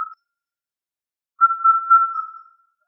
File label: Indian cuckoo